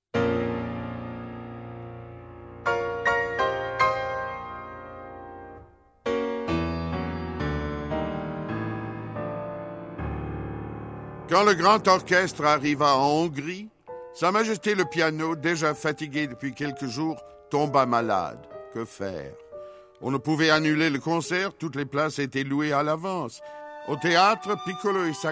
hongrie cymbalum; passeport piccolo

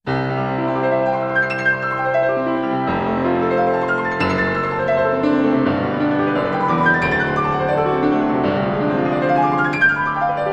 romantisme chopin etude pour piano

